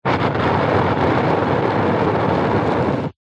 nature fire flames small burn wind blown then out short